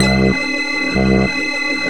ALERT, Anime Sound FX, SCIFI

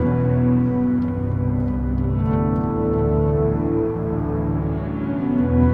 Acoustic Drone, Long Loops

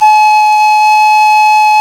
PANFL, Pan-Flute; Sound & Vision - Gigapack I CD 2 (Roland); Brass&Woods2

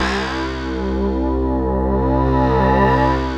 VFX, Synthesizers; VFX DIGIFA

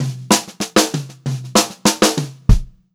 FILL, Pop-Rock Drumloops; USB Soundscan vol.37; AKAI

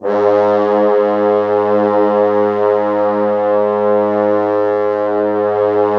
G#1, Orchestral Brass

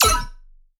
Riddim Zap

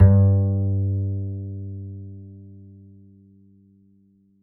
mf G, G string upright bass; Upright Acoustic Bass

